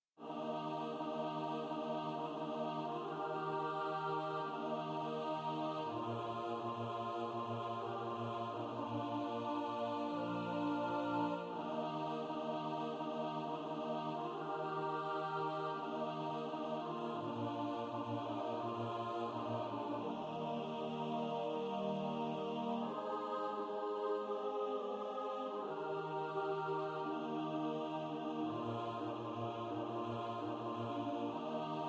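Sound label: alto, practice music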